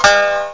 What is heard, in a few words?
Shamisen; Atari Mega Archive; synths